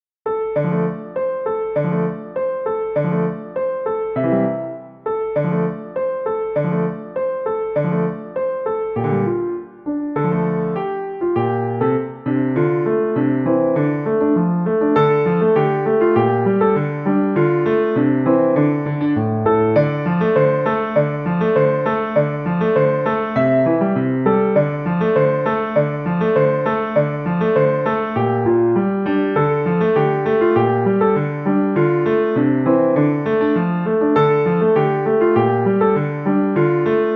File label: solo, piano